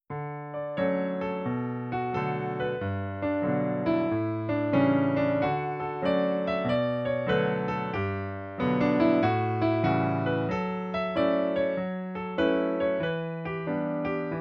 7th chords

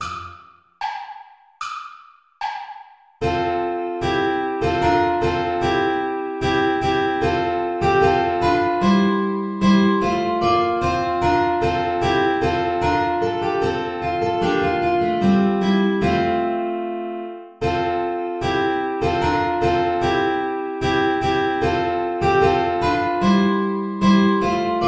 play along without guitar; wir lieben sehr herzen

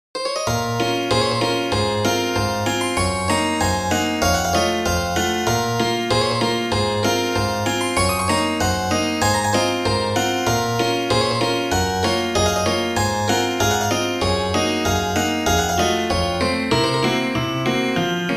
dulcimer hammers

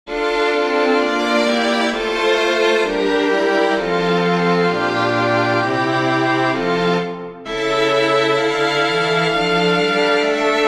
chorale for string quartet; bach johann sebastian; haupt voll blut und wunden

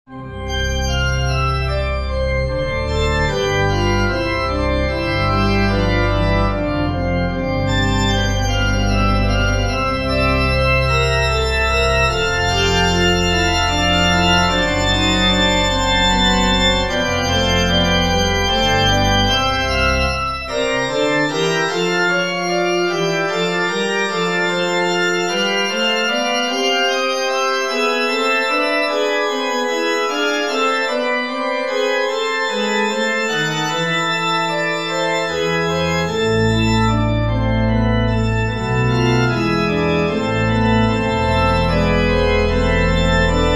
prelude major for organ; krebs johann ludwig

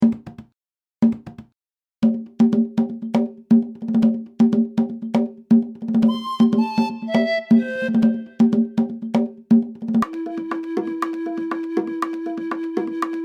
conga flute

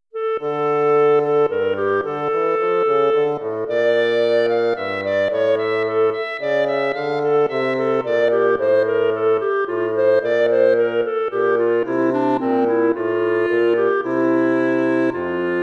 courante

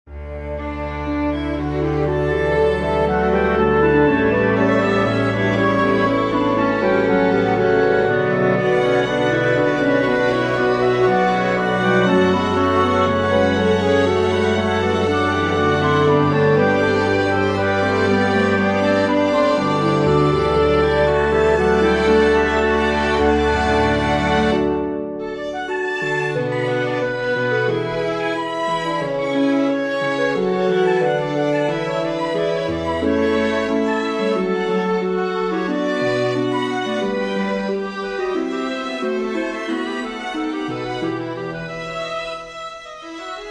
major for winds strings; bach johann sebastian; sanctus from the mass

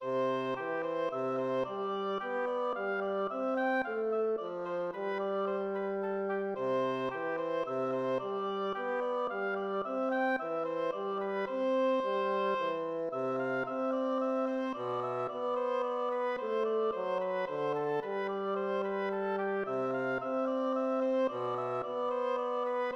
tempo minuetto; hook james

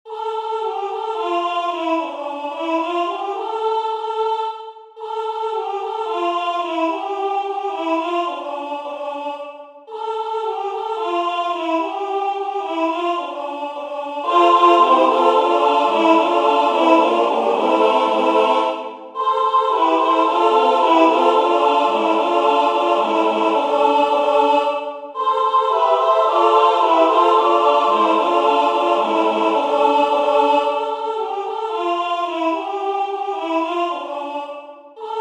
chorus ssaa

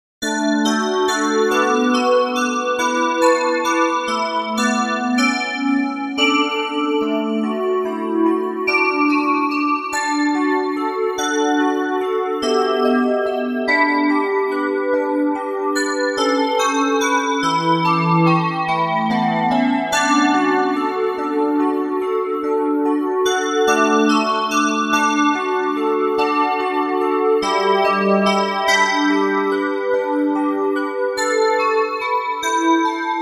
for english handbells; foster stephen collins; beautiful dreamer

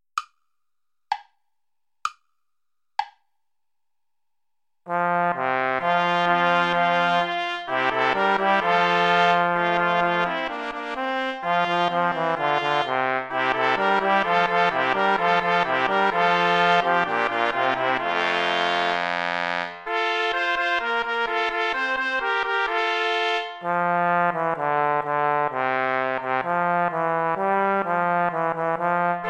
play along without trombone; praetorius michael psallite unigenito

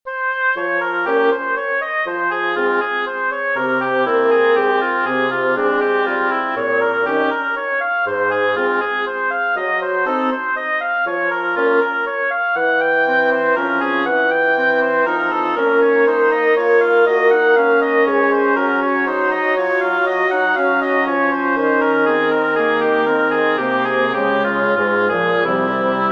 pour harmonium for double reed trio

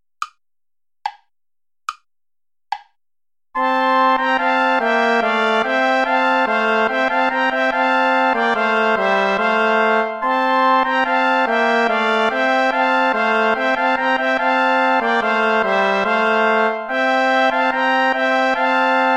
play along without trombone; traditional; deck the halls

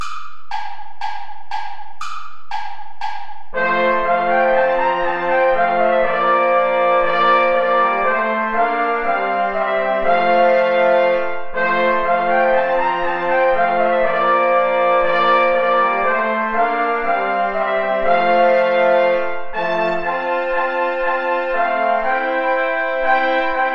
play along without trombone; steuerlein johann; mit lieb bin ich umfangen

